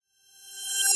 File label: swap